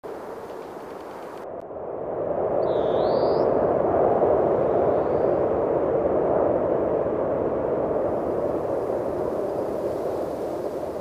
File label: Amb Snow Ext